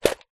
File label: shells small stones plastic bucket down on ground; foley